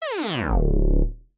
synth space weird synthy sci fi melody accent fail; Jessey Drake